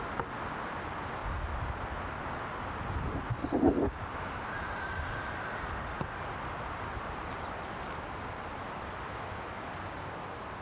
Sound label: Wind Through Trees